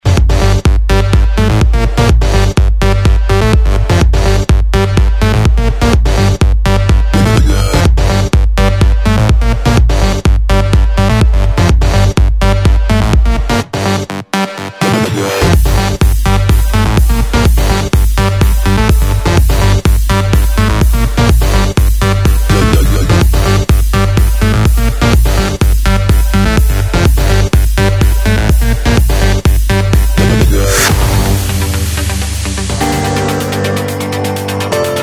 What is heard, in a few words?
dance